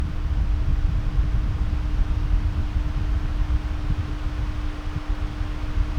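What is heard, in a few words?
wind external; jvc gc px100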